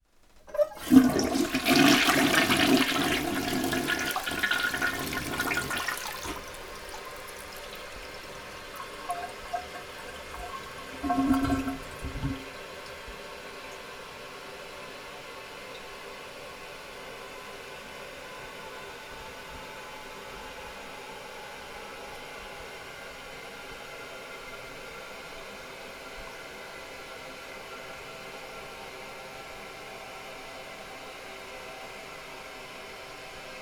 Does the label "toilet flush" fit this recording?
Yes